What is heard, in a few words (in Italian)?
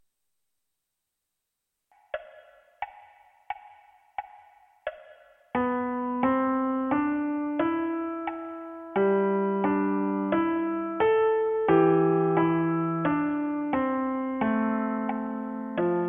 piano unite